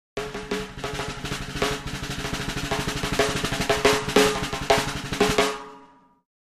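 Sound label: Drums Snare Comedy Accent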